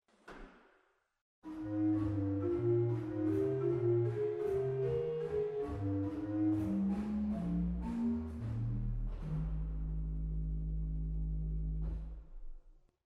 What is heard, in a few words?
Qvintadenen Bass 16, organ; waltershausen